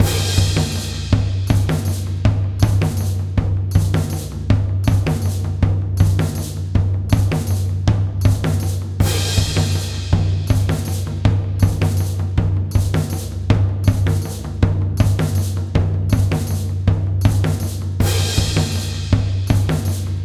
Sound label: Village Song Drummer